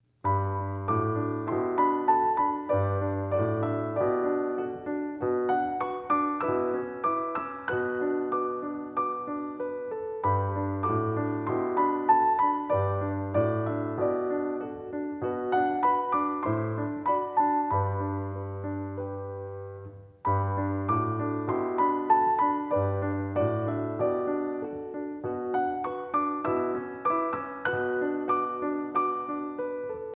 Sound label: Piano Solo